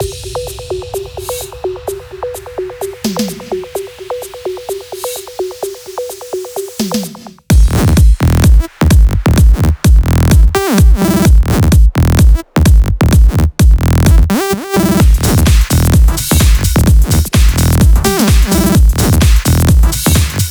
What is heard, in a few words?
128BPM Mix; Vengeance Samples; Wasa Kit